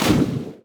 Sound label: enemy elemental melee